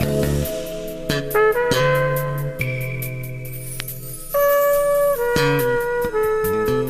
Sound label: horns sensual, loops, phonky